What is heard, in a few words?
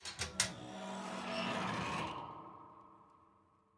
Metal Bar Doors Open; The Town